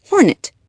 HORNET

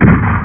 ROBOTS COLLIDED SOUND; Escape!

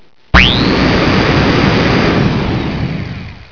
MIXER

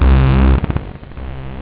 danger, rave

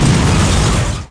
Error

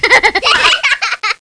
kids new; Widget Workshop; Maxis

